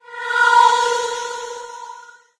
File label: space exit windows; windows 9x; sound schemes